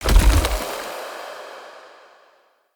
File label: Healing Totem Activate; Fey; Ward